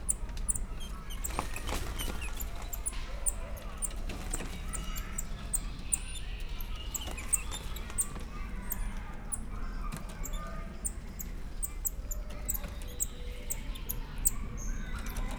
háttérneszekkel, lisztmajmocska; szegedi zoo 2011

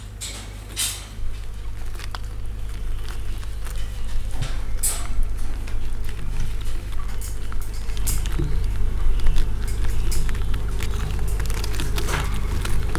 eszik külső zajok, kerti pele; jaszberenyi zoo 2015

